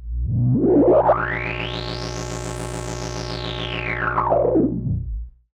Odd Modular; radiosonics

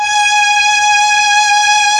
STR, STRING

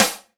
SD, Dance Drums

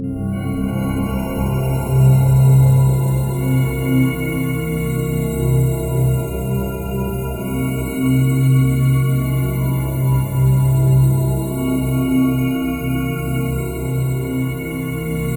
amb c, Alien Ambi, Chillout; Best Service ProSamples vol.36

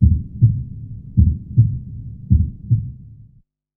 loop; X-Static Goldmine 3

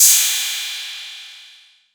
PERC 808

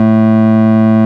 ORG, Farfisa Clarinet

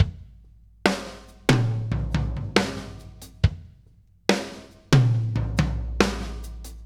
Loop, Drum Tools, Laid Back

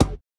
GLASS; Spectrasonics; Metamorphosis; GLASSBABY

